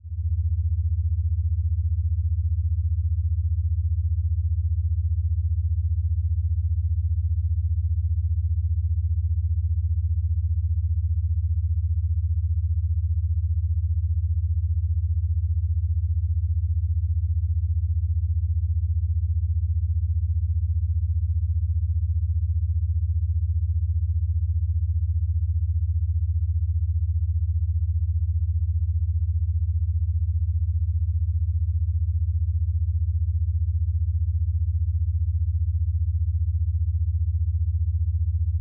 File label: 75Hz Monaural (15Hz Beta Waves); 344 Audio; Mind States